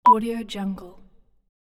beep

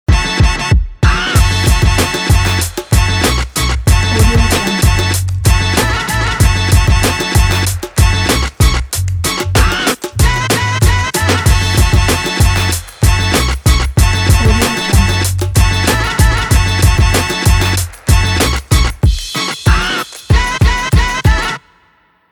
old school hip hop ident

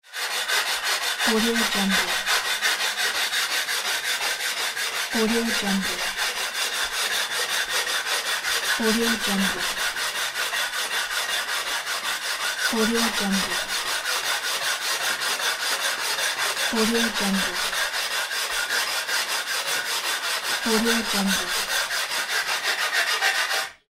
sawing

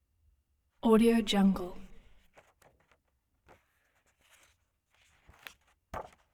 book turning book pages paper movements

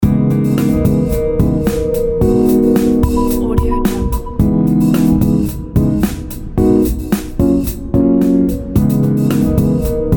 lounge loop

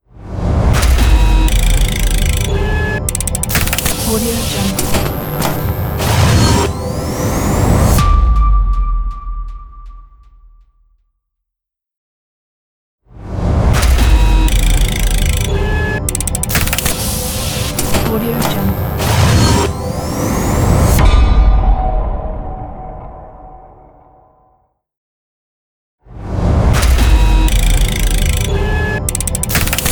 transforming industrial logo

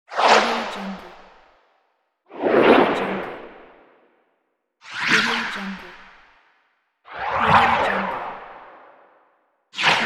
whoosh

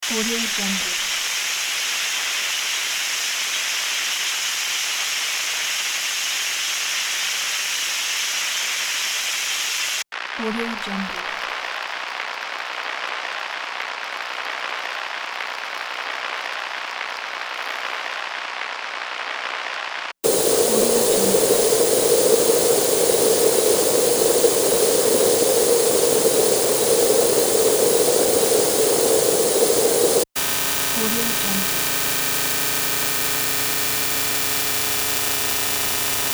noise collection; ultimate noise collection vol 1; ohmlab

